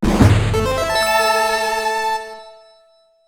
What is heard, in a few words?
Item Pickup